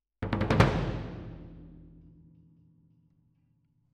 Cassa Main, piano

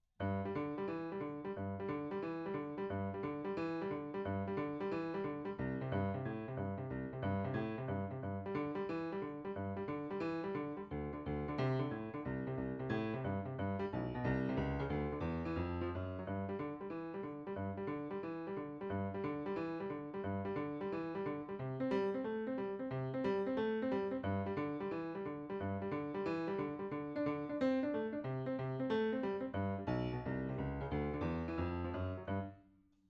Piano, RNR for piano

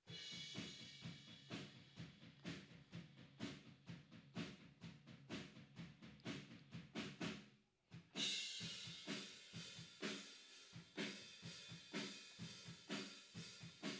Vocal

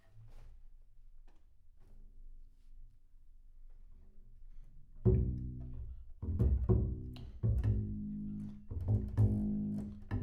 Bass Room

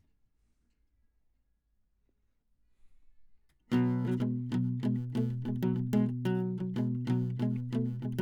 Classic Guitar

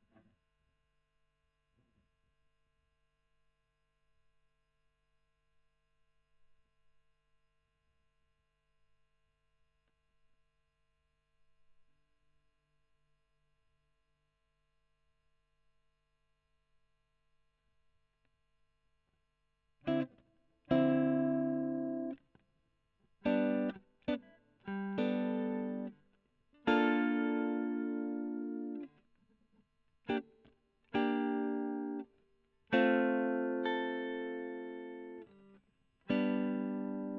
E.GTR; Gefell

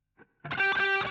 GTR Solo

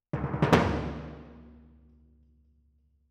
Timp

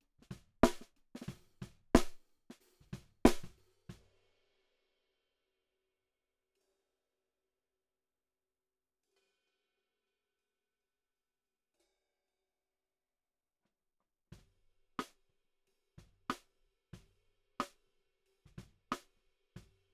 SNR B

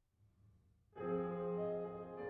Piano 480

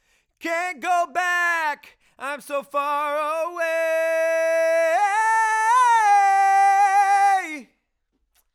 Chorus

